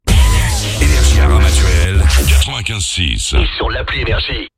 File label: JINGLE; RAMATUELLE, 95.6 FM Normal; NRJ